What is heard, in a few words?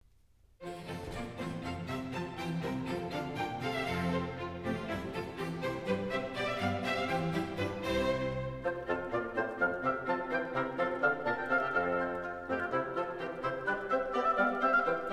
Water Music Suite No.1 in F Major Overture Largo; George Frideric Handel; HWV 348; Allegro